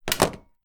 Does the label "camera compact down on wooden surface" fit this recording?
Yes